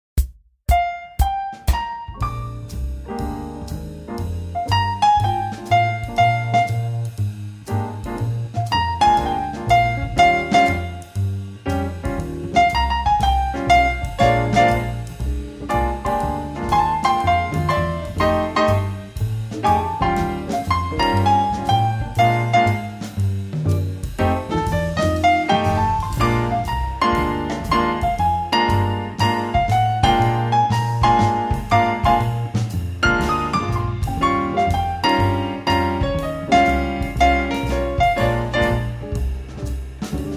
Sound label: piano blues swing; transcribing solos